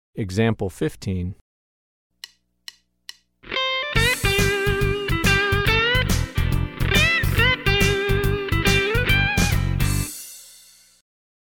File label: Country Rock